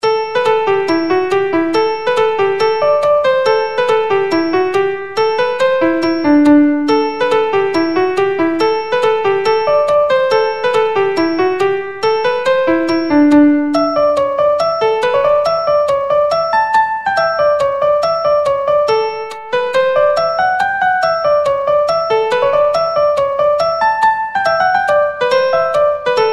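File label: Polka, Traditional Irish Music; Ballyfin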